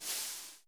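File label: JAZZ snare; JAZZ KITS; Crypton; DrumBase5000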